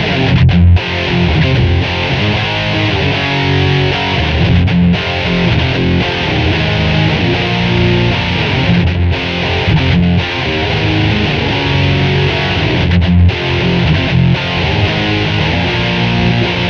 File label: Guitar, Rock